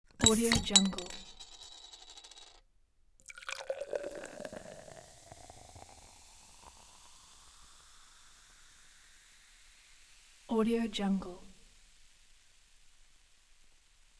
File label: opening bottle and pouring beer